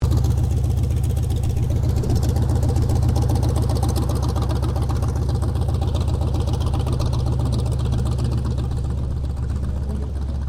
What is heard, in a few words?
AIRCRAFT CAC WIRRAWAY TAXI PA; CA-16 Wirraway; Airplane; Vehicles; Airplanes; Aircraft Collection; Twistedtracks